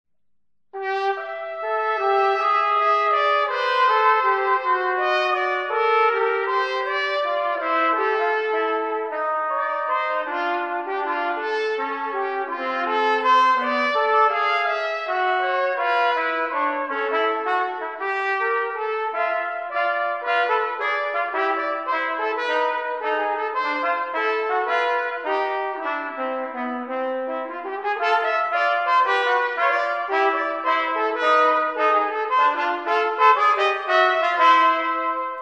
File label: jazz waltz